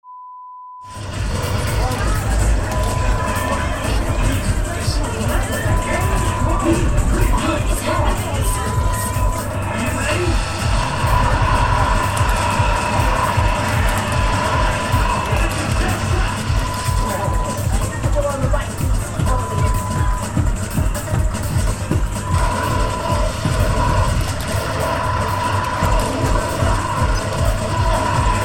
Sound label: arcade sound